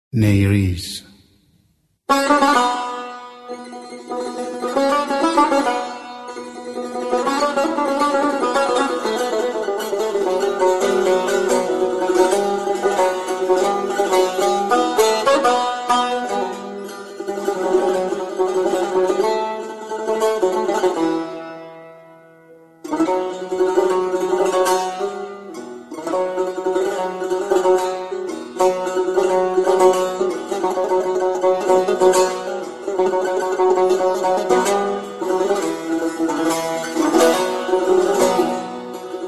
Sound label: Ney Rast Panjeh